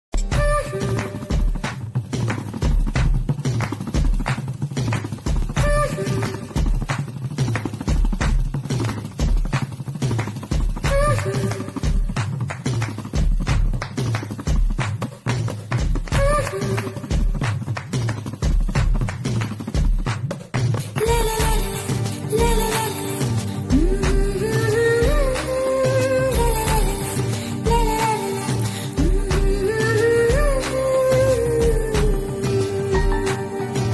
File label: song bgm, tamil; ayyan; tamannah